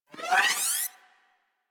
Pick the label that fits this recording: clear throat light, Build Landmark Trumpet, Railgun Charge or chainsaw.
Railgun Charge